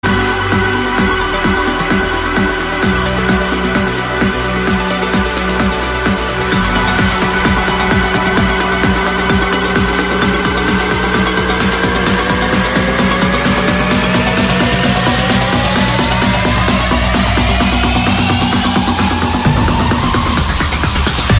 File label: trance; balgium tomorrowland